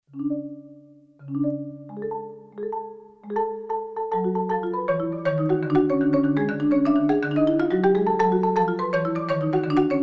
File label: marimba